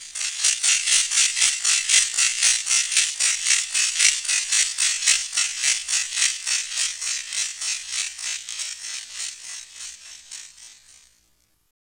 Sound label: STRETCH RATT; ENVIRN-AMB